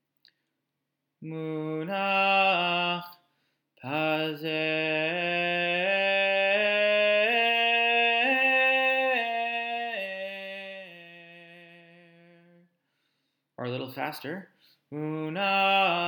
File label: Torah Trop Pattern